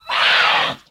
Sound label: Duskbat Attack